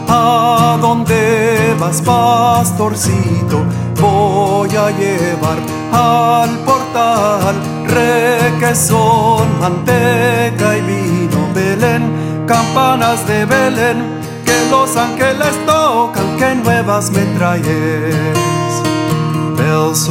Bells Over Bethlehem, christmas carols villancicos